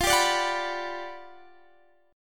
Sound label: G Major 7th, F; Listen to GM7/F strummed; GM7/F Chord